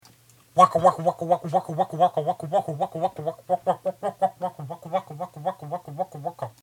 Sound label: raygun